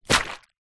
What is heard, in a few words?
small plastic bag filled with water drop on ground; foley; zapsplat